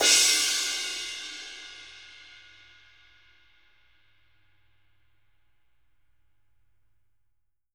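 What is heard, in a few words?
dark crash, Living Drums, JZ CRASHES; Sampleheads; Peter Erskine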